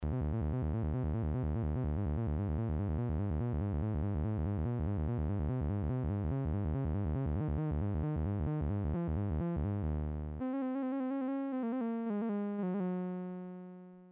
Qunexus spielt CV

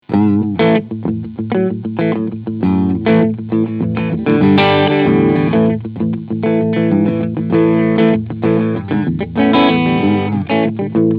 Epiphone Les Paul 1960 LTD Cleansound beide Pickups

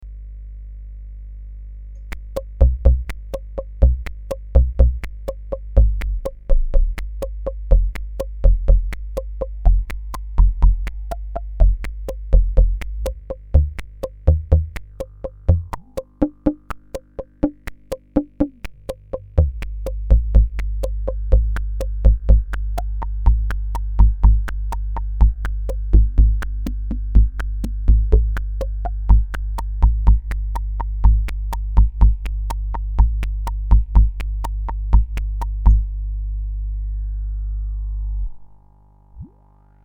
D1000 uebersprechen

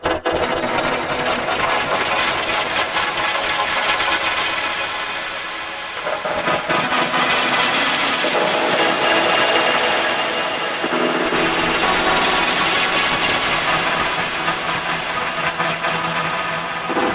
Emu EIV Granular synthese E6400 Sample Variation Fx

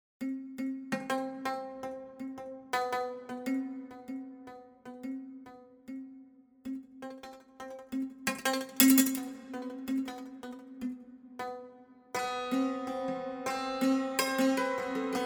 Cymbalum Ungarisches Hackbrett

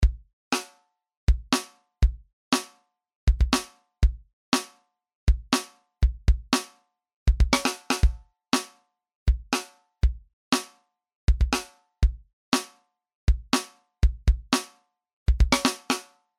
Drums Charakter

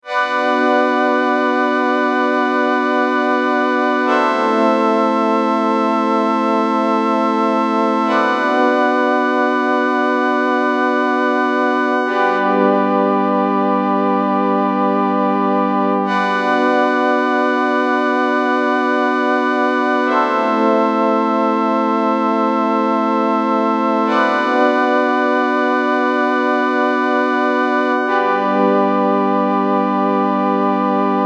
Flange DX7 ohne FX

